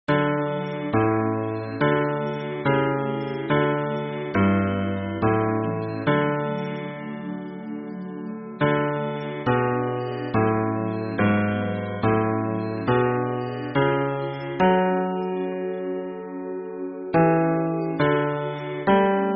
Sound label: hymn bass